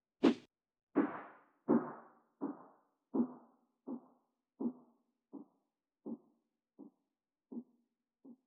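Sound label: sfx ping pong noise low; hofa plugins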